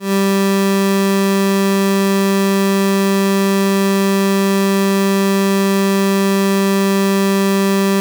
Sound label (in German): saegezahn; harmonie